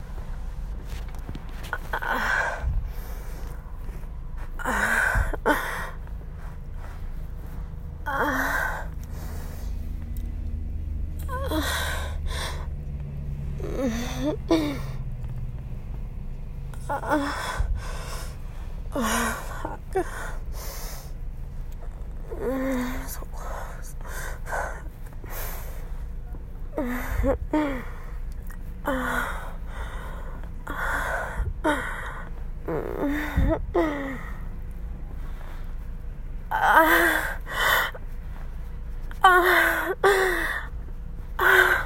A Quickie After Work In My Car, loud, needy, begging, wet; F4A; i love getting to be loud; wet noises